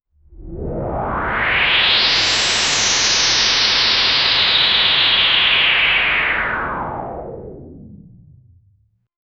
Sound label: black hole disruption